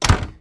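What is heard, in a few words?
door close; Mafie 1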